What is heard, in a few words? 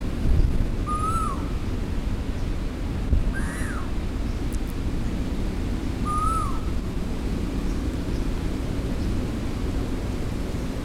koel asian Eudynamys scolopaceus